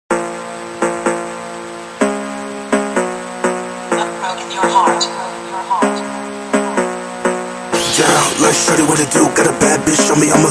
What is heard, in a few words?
Phonk; ringtone funk; آهنگ زنگ فانک